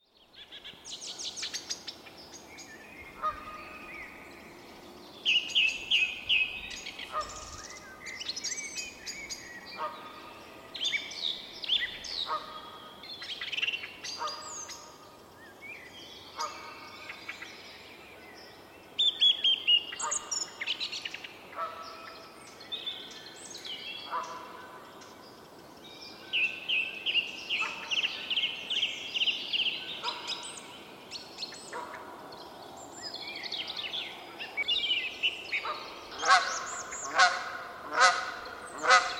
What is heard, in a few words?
nature recording a song thrush and a goose in the forest